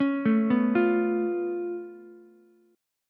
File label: C sharp Augmented 7th, C sharp, down, Ukulele; C#+7; arpeggiated; JGuitar